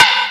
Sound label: Snare, E-MU Xtreme-Lead